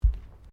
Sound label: Footsteps Bare feet Tiles Step